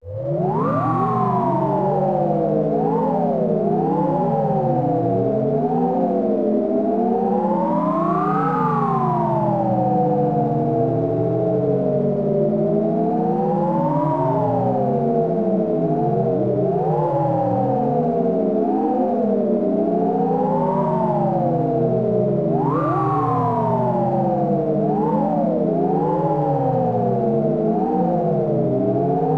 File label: Wind; Sound Novel Tsukuru; SNES